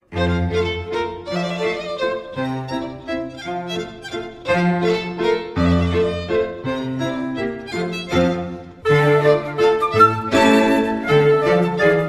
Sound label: Russian Mazourka